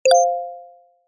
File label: short digital notification alert